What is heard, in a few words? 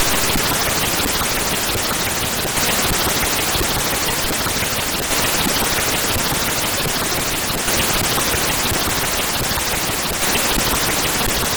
intro, MOS6581R4; Kiwi Magic